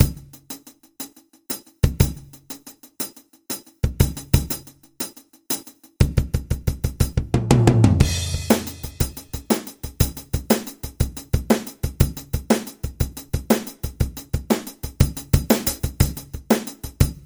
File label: drum blues